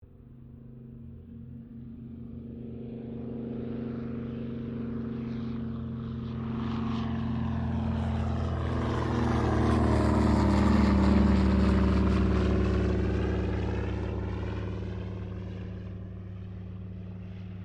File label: AIRCRAFT DOUGLAS DC3 TAKEOFF; Airplanes; Vehicles; Qantas 1942 DC3